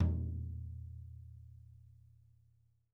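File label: floor tom; drum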